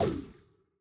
ball bounce heat; CrossCode; battle

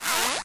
launch; World Of Goo

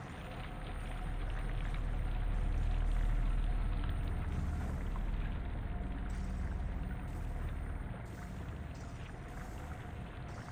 arid atmo; CrossCode

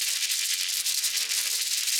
ferro shock loop

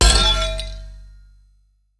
dark crystal destructible

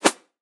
throw hi; CrossCode; battle

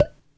pipe Hit; World Of Goo

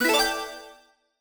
quest task solved

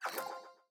chest opening

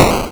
hurt skully

